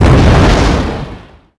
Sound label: Small Exp, Explosions